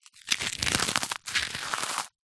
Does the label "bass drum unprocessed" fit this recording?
No